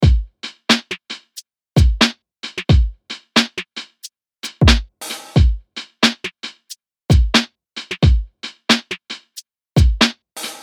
Lo-Fi 90BPM

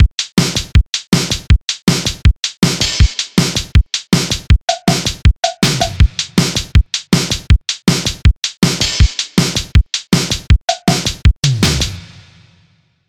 Cheer 80bpm

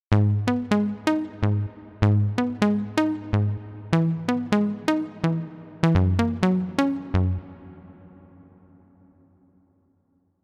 Hybrid Standard House Deep House; midi wizard 2; Unison

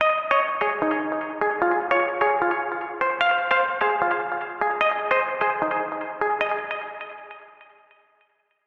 Melody Pluck Pop; midi wizard

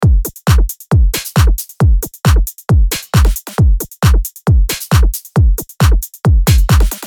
Trance Psytrance 135BPM

